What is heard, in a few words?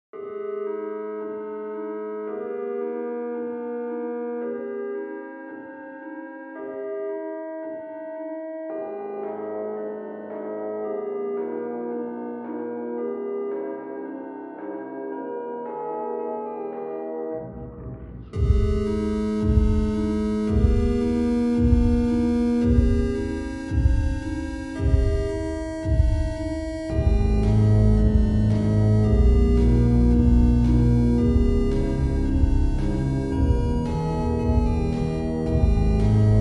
8 bit slowed reverb bassboosted